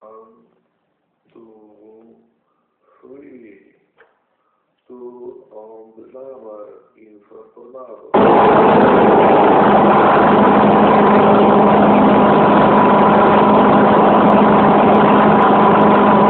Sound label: Jammer real test 3 m; Iphone 5